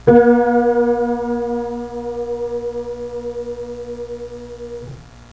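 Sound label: b3 piano, notes played from a piano; piano on campus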